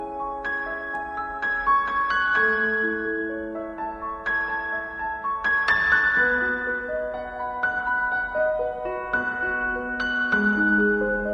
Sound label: piano